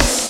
kit; Rubber Chicken Software Sample Bank

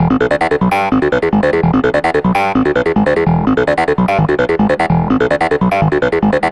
Groovin Arp, Arpeggios & Sequences; Dr. Fink's Funk Factory

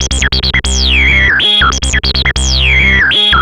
Analoop, Industrial Analog

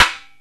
CONGA SET; OMI - Universe of Sounds

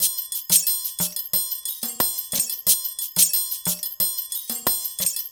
Percussion, cinematic funk; Sonic Foundry (Sony Creative Software); Urban Tone Poems

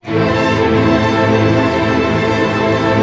STR ARP MI, Symphony Orchestra, STR Arpeggio; Roland LCDP08 Symphony Orchestra; STR Arpeggios

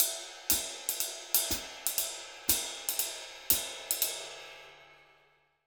RID, Jazzistic, 120; Univers Sons

